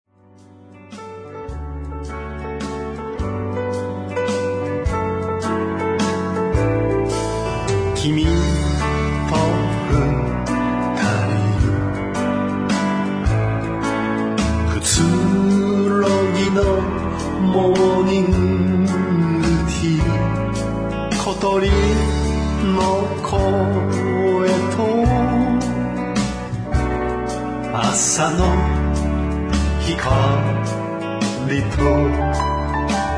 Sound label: waltz